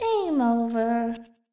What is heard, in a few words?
gameover; sm64coopdx; char-select-extra-chars